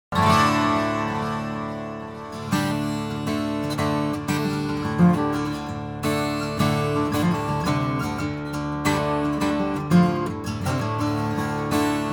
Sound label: Guitar; Diablo Anniversary